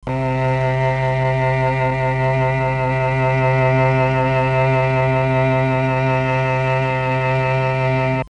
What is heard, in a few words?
m300 cello